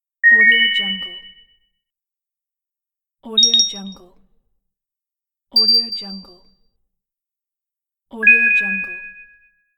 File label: twinkling star ui